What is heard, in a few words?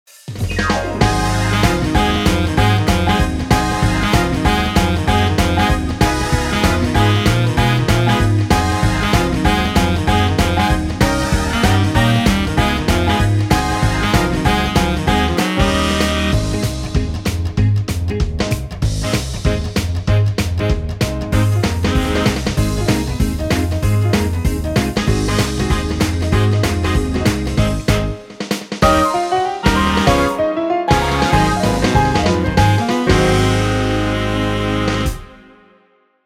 Rock Roll Jive; Wersi